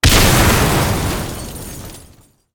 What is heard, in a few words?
exploding Car; narcos